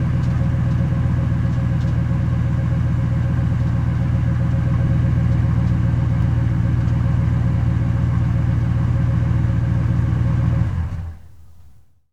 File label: Truck Expedition Idle Off; Trucks